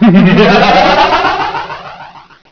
rire diabolique; csde rambo halloween

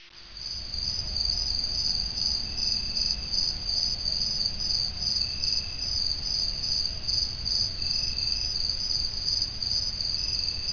grillons; de fuchi

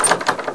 porte ferme; cs paranoia

